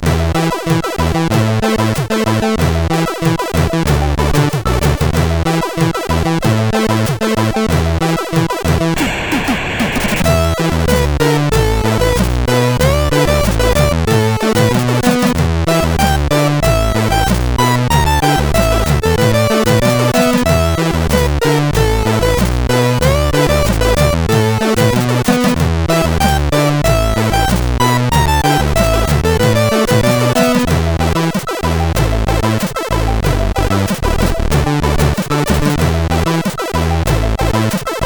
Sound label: Disco Bass, sid, CSG8580R5